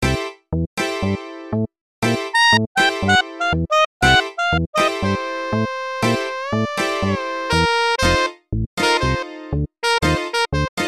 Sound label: MIDI blues